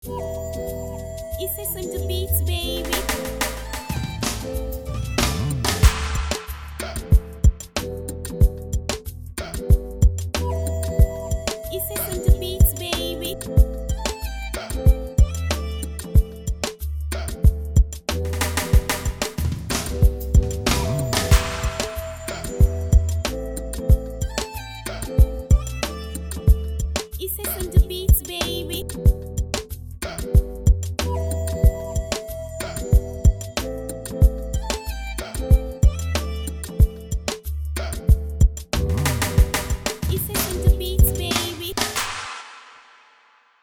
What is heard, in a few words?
freebeat Afro pop